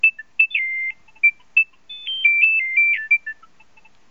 nokia 3510i jitters ringtone

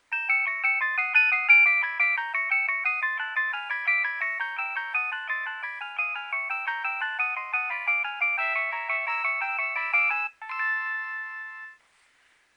nokia3100 ringtone

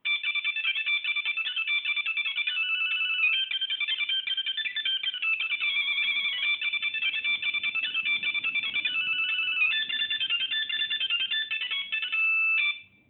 nokia 1100 toreador ringtone

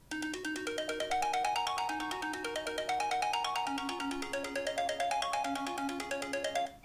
apple iphone 5 radiate ringtone

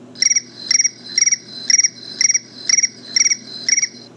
crickets ringtone; apple iphone 3g 4

